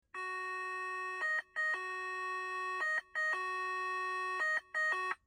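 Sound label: Fire Brigade (Italy and Czech Republic); 911 Signal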